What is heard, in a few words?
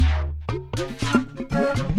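Music Dpad Base 120; From Dust; PS3; Xbox 360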